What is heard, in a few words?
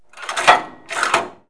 laundry; citylife; business